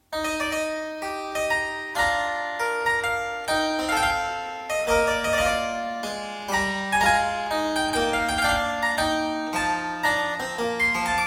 Sarabande, Harpsichord Music; Colin Booth; Louis Couperin

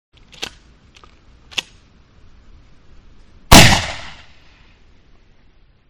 12 Guage Shotgun; SOUND EFFECTS1

